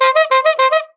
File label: bike horn